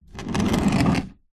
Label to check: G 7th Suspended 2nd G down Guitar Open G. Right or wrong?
Wrong